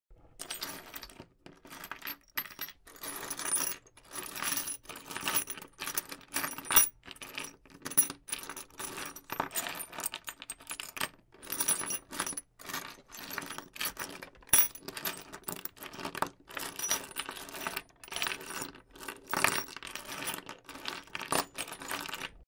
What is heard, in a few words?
bolt and nut; پیچ و مهره